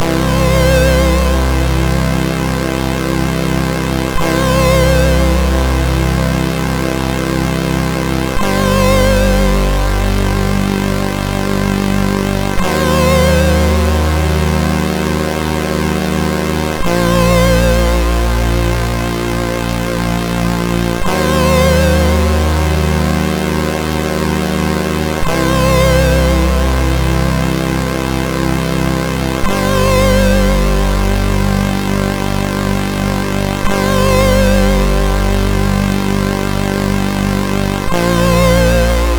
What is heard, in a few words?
E-Load loader, MOS6581R4; hvsc